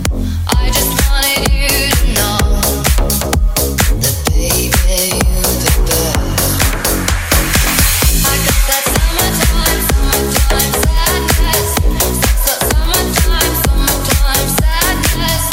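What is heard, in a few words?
128bpm D flat minor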